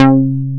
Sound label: C4, J-106 BS; Drums In Your FACE!